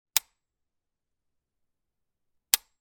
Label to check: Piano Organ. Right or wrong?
Wrong